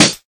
old school subtle reverb acoustic snare sound F sharp key